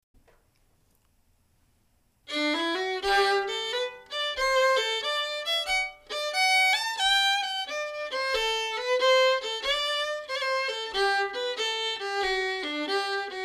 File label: Hornpipe slow